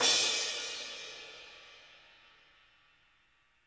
CRASH; Sonic Reality; Interactive Drum Kits; 90s sample cds

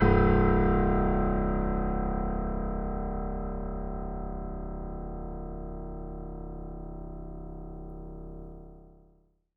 Acoustic Piano; InVision Interactive; Miroslav Vitous

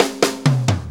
4-4 Fill, Drum Loops; Fills; Sonic Foundry; Drum Tools

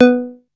BASS SEQ. B4; SEQ AND PERC; Music Store - Claudius Diemeter Schwerlos

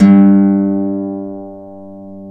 Celtic Harp G1; Multisamples; Digital Sound Factory Planet Earth

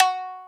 Shamisen F#3; Multisamples; Digital Sound Factory Planet Earth

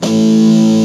Power Guitar A1; Proteus 2000; Multisamples; Digital Sound Factory Proteus 2000

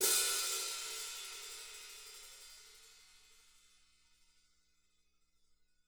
hat, drum

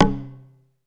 PERC C2; SEQ AND PERC; Music Store - Claudius Diemeter Schwerlos

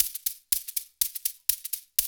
120 Triangle; Tri.Again

